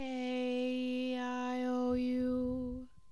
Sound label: aeiou Mid